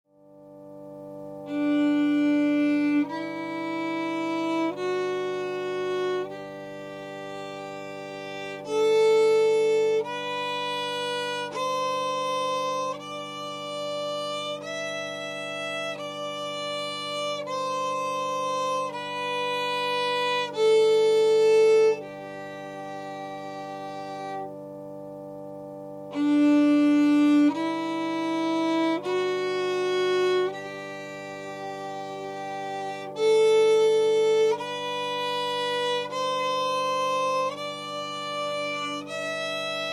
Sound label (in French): echelle